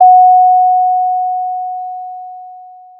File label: Sound of a doorbell